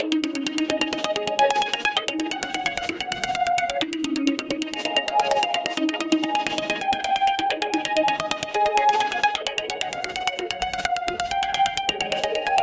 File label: looped music neutral low